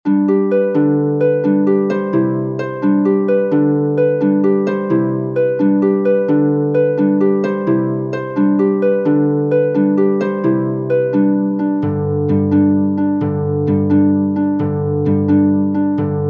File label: march for flute oboe harp; macallistrum